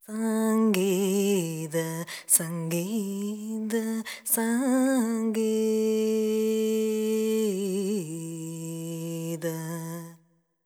ETHNO FEMALE, Vocals; ethno2

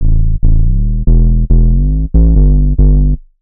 ELECTRO BASS 140 A

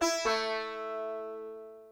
ethno, 125, A

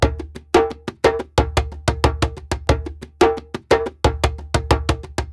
ETHNO PERCUSSION 90; ethno2

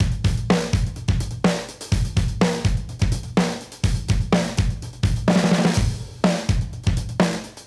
Modern Breaks; Touch Loops; ClassicBreakSessions

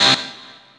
dance chord

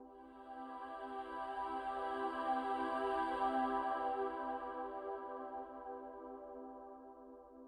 SYNTH PAD ETHNO 125 A, Sphere

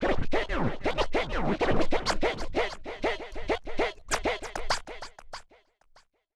OLDSCHOOL SCRATCH 96

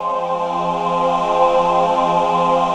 PAD

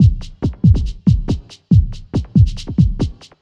electro beats 140

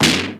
BEAT, FX PERCUSSN

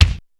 BD KICK; drum; BASSDRUMS

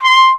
Trumpet staccato C5; Orchestral; DirectWave; Varazdin Orchestral

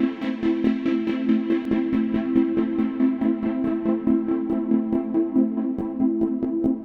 techno pad 140; Techno2; Keys

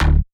perc; Anthemic.Electro; drum hits

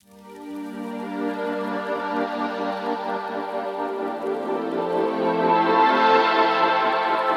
130 Cm7, Music Loops; lovecentre; Touch Loops; BlissedOutBeats

